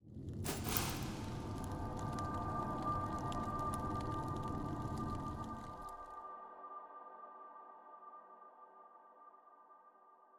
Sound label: stone tablet; cutscene 2-3